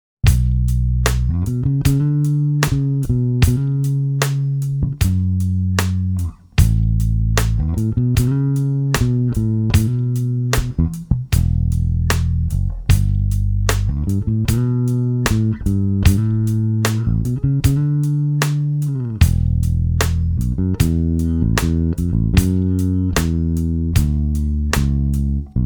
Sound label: melodic